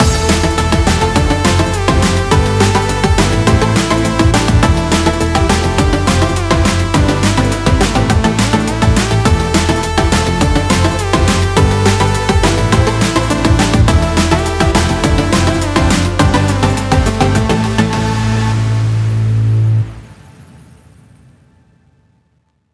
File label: Funk Outro; Funklift